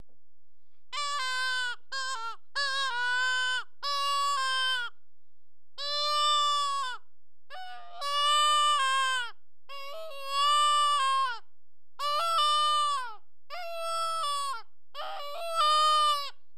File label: arrow shaft bleat